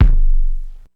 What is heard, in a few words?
Kick, Ensoniq ASR-X Pro